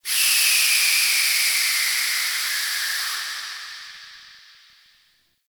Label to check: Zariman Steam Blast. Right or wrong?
Right